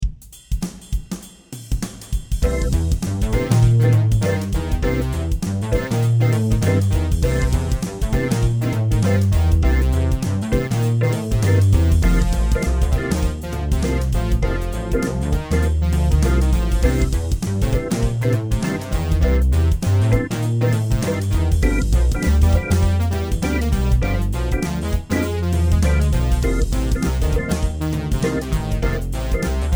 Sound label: MIDI blues; spellman studio